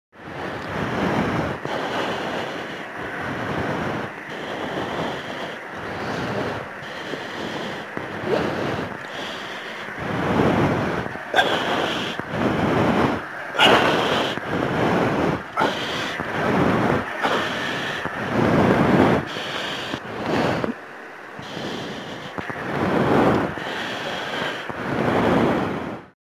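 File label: Breaths Forced Steady, breathing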